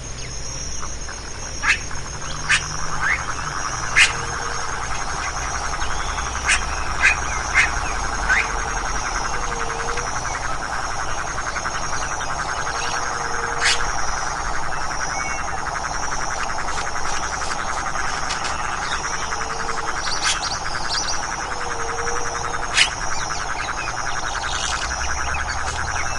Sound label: bird